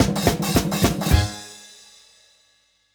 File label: Brawl Rock stage complete; when vikings attack